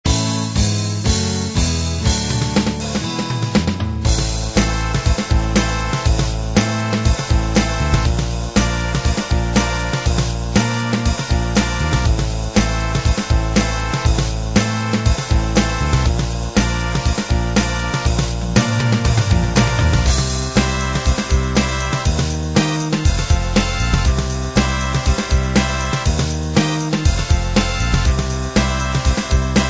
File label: uk pop combined